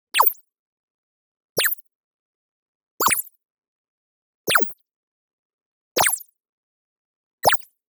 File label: LASER Friendly Zip High; Anime Essentials